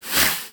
Pet Snort; Enemies; Warframe; Catbrow; PetShip

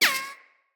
Bullet Impact Ricochet

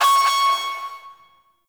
Fortress Klaxon Alarm Finished; Ambience; Gameplay; Warframe; Grineer

